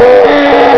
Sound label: slv pain; svencoop; classic; imp